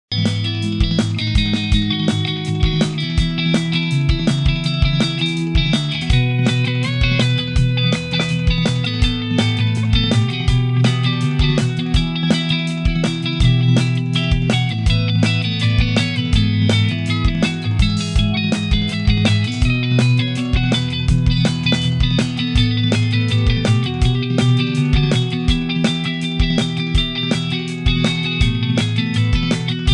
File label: Heavy Rock Guitar Ringtone